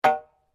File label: SLEDGEHAMMER METAL IMPACT MEDIUM; Working with a sledgehammer; Hammers; Tools